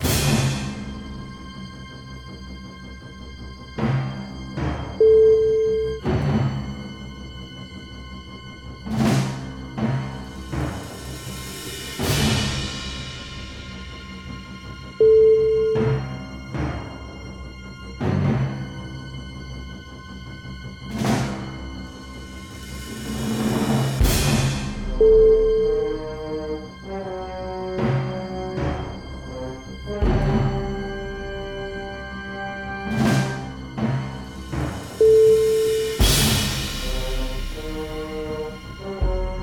war suspense

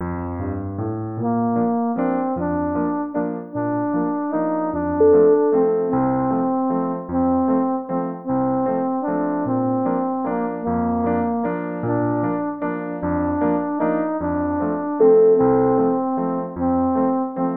baby waltz

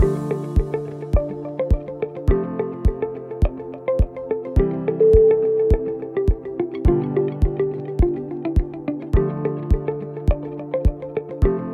ambient creative

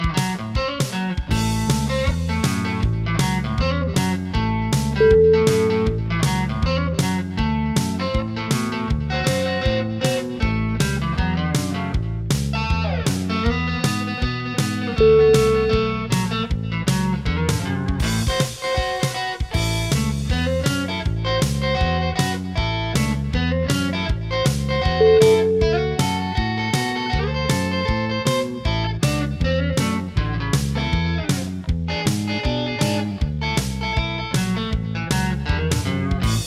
rockabilly

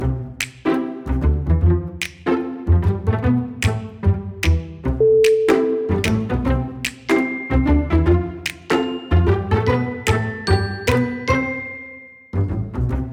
happy and cute

